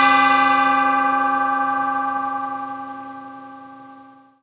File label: bowl